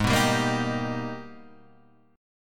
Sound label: G sharp Minor Major 9th; G#mM9 Chord {4 2 5 3 4 3} strummed